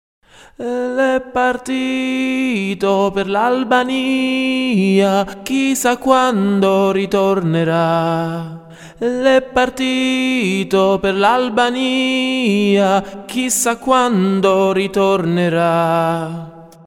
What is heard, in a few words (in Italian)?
tenori